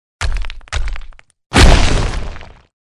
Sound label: attack; hero sound